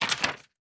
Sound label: Paper Page; GameSFX